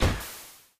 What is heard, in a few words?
robot shoot big; steam camp